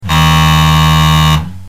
Buzzer

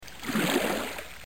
Canoe

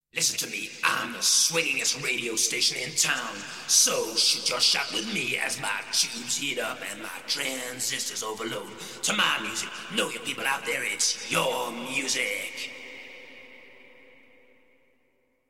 Jingle

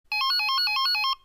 Ring

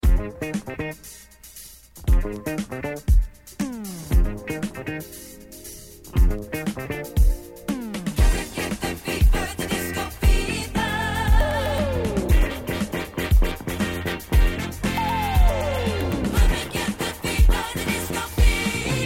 Jingle

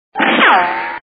Ric gun

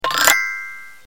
Xylo up

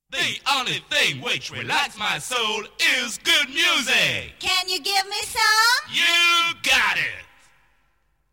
Jingle

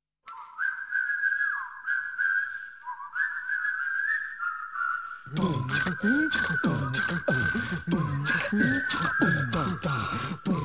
Jingle

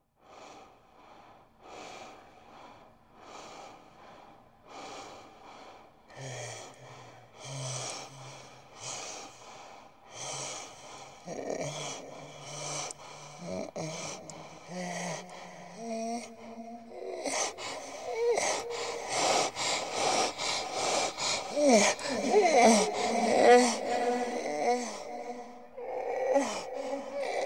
Jingle

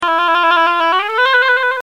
Oboe

